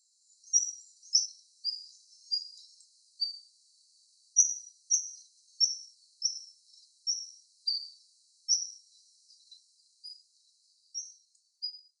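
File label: ezomushikui, Sakhalin Leaf Warbler; fukuoka; koe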